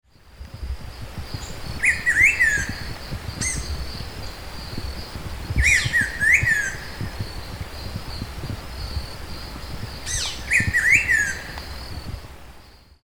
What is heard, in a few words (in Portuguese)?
Saltator fuliginosus Bico de pimenta